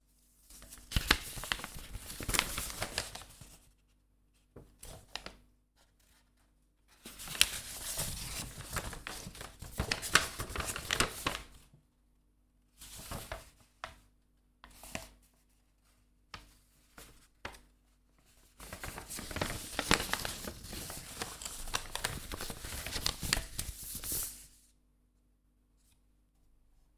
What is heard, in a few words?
Paper In Hands